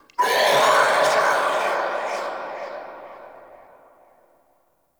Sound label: Ghost Attack, Vocals; Enemy; Nightfold Test Range Ghosts